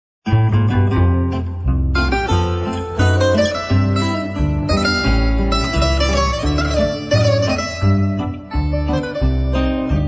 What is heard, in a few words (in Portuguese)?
Guitarra Triste